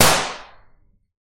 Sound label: classic gun shot